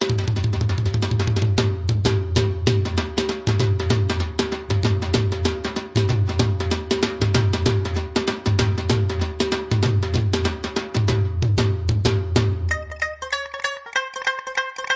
indian music panjabi bhangra beat bollywood

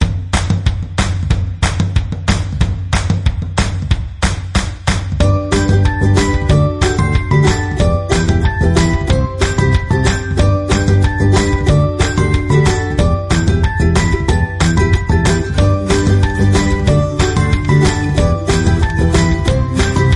Happy and upbeat folk children folk acoustic

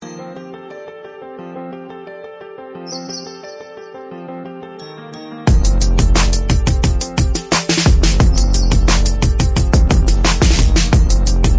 Accelerando ringtone

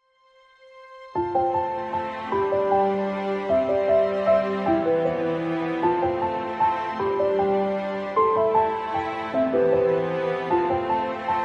Romantic

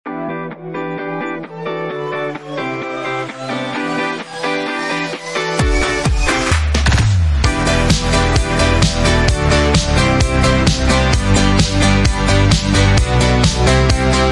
dance music

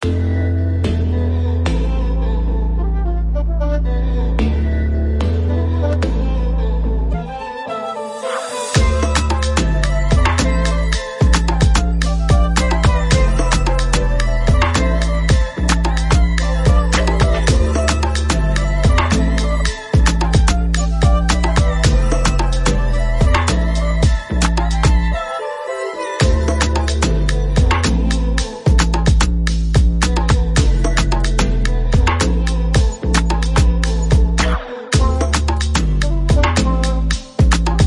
Old bollywood dancehall music

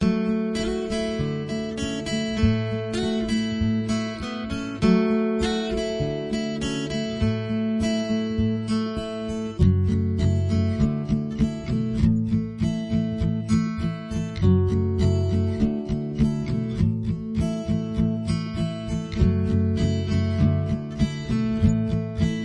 Soft indie folk

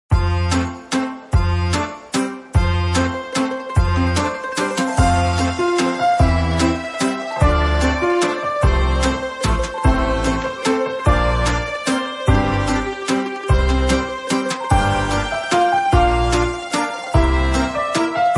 French positive romantic happy music

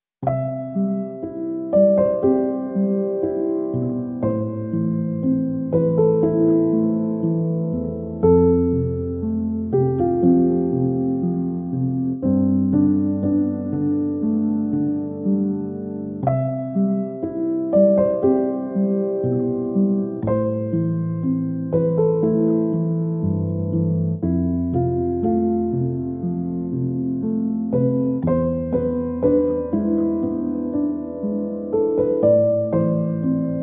Relaxing melody

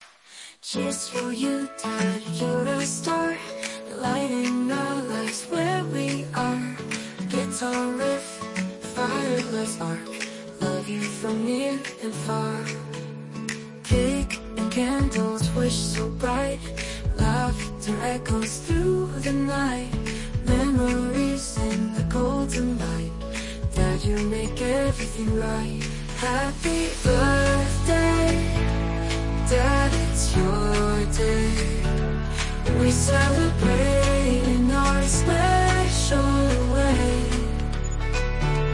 bollywood

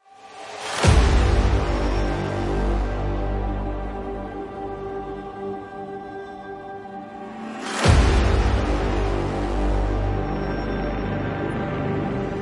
Dark movie trailer ringtone

